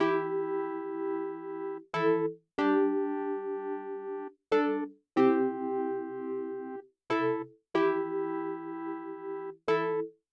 Keys FX

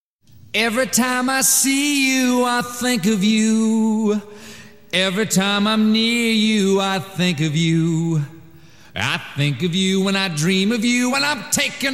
vocals

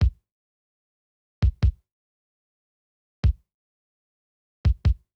Linn Kick

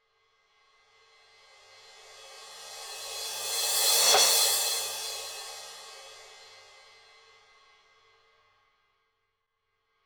crash

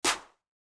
Clapz; Remix Sample Pack

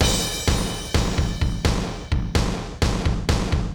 drum

